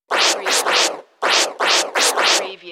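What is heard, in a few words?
DJ Record Scratch Effect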